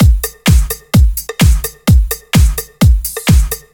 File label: Housebeats 128BPM; Vengeance Samples